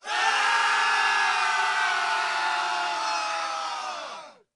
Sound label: battle cry; medieval raid; zombiesurvival